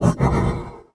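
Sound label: Mech Fidget Vox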